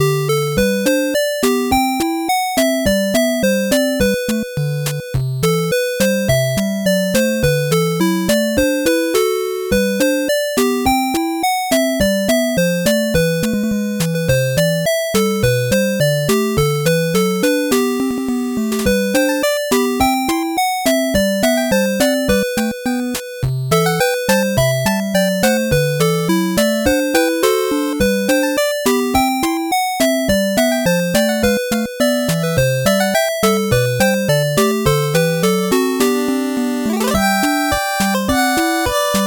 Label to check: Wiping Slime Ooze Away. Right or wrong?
Wrong